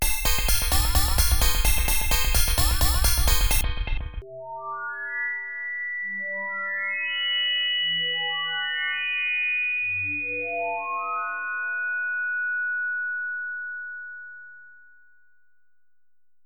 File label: Synclavier Sequence